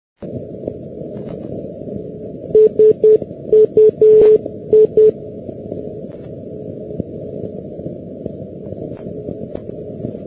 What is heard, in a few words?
ndb tampere suinu; maakeski dx; europe